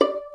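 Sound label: Violin pizzicato D 4; InVision Interactive; Lightware VOL-1; Instruments & Percussions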